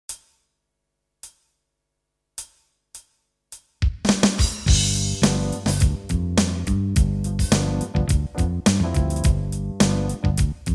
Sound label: backing